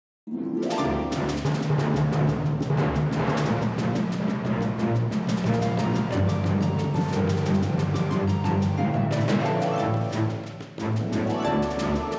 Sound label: Action; Gamora's Family